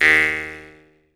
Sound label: Jaw Harps